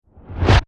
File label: Production Element Whoosh Reverse Fast; Whooshes; Sound Design